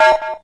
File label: beep; Left 4 Dead; Source Engine